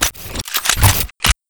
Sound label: gun load; snds jack gmod